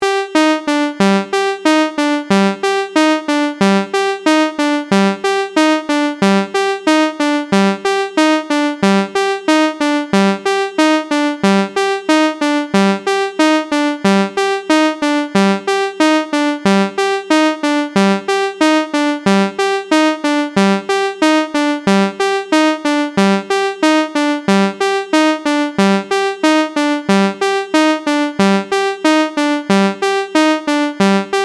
rhthmic gate; bluewave by music sound software

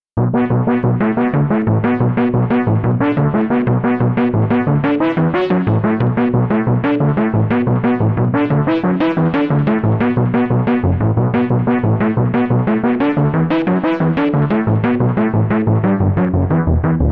sq 8th 3xsaw; the transmitter soundset

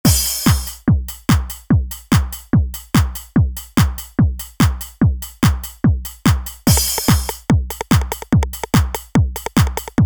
d909 kit; superwave

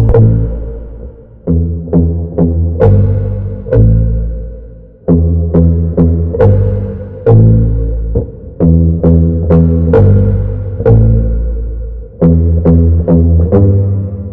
guitar dry; folda; sentinel av

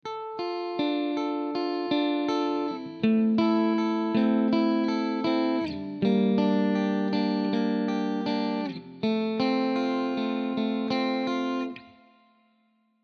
strato wet arpe; Chiqui Audio; Arpeggio 58 160 Bpm D Min; Fender Stratocaster

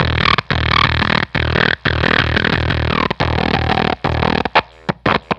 Fuzztest drum

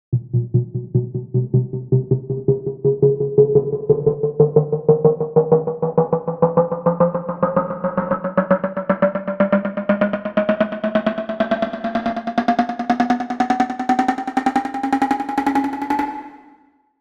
vital presets; chiqui audio; vital fx presets

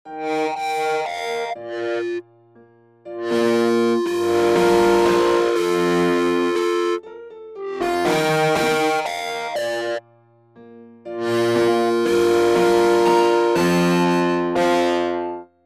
piano wet; chiral audio; promised neverland

